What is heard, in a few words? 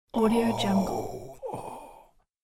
tired male moan